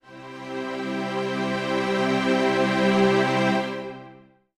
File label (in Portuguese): Logo Musiquinha; wife quest